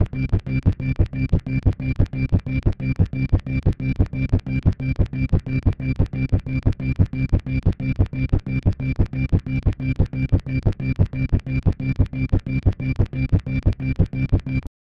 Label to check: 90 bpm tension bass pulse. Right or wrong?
Right